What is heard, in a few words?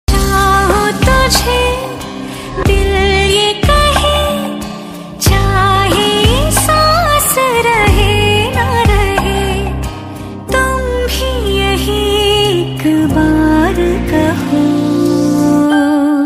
Female Song Ringtone